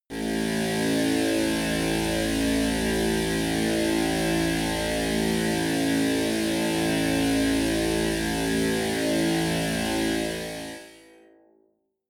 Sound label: drone roar bass; musikelemente; drones